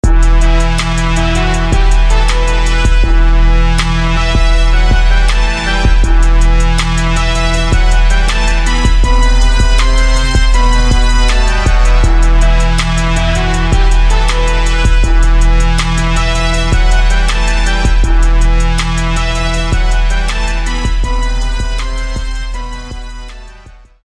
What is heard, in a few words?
Crunk Brass